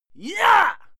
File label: male fight scream yah short; schreie; menschen; soundpool